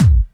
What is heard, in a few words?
soft hit whistle